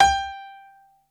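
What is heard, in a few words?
PNO G4, piano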